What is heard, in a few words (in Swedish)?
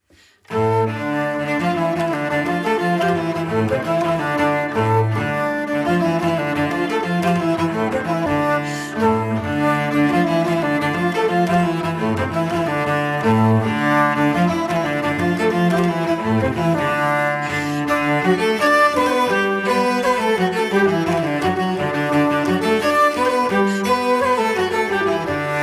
vals; vals efter ola lans